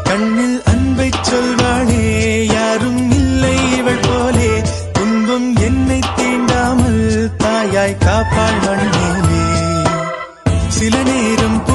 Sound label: Tamil